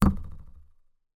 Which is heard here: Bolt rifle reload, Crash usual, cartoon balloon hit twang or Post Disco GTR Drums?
cartoon balloon hit twang